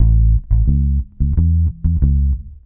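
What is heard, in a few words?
reggae bass A; Best Service Advanced Media Trax Modern Composer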